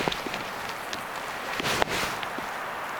tama on kasittaakseni jonkun linnun aani ehka sinipyrsto tai jokin lahilajeista

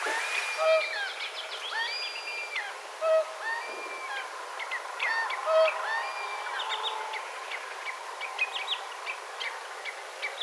laulujoutsenen kuup tai puup aania, erikoista laulujoutsenen ääntä, kuup tai puup

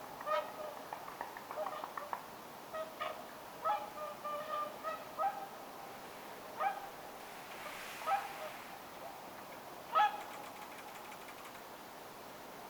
laulujoutsenen aania

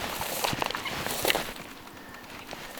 erikoisen kahden aanen aanen paasti jankakurppa lentoon lahtiessaan

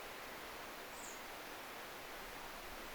onko sinihohtorastas

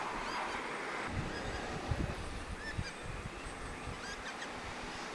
itainen rakattirastas; aanesta arveltu

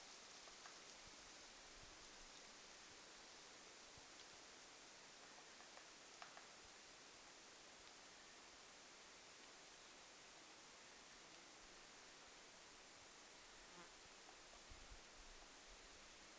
voisi olla pohjantikka

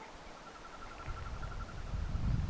telkan siipien aanta

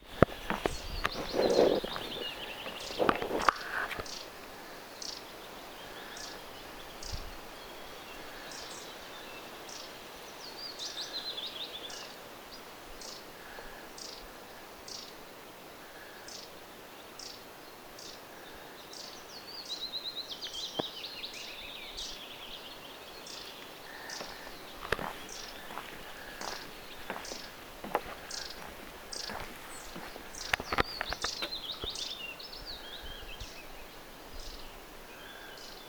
pyrstotiaisen huomioaanessa erillainen savy; jostain syystä pyrstötiaisen huomioääntelyssä on erilainen sävy kuin aiemmin

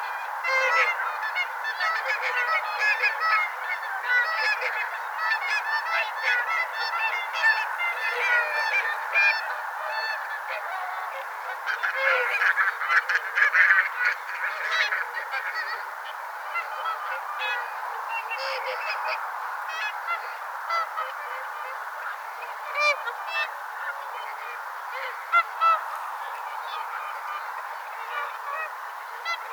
vahan laulujoutsenten ja metsahanhien aantelya

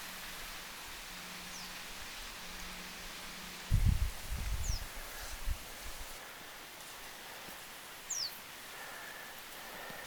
pajusirkkujen aania kolme

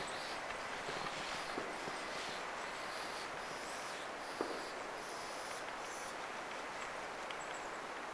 paikan paalla maaritin taman talitiaisen puollustautumisaaneksi muistuttaa paljon suippopy; suippopyrstokurpan

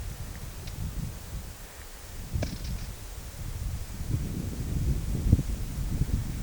tuolleen aanteleva hernekerttulintu

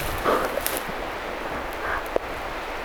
metsakauris

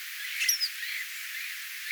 mika laji voisiko olla jopa pikkupajusirkku

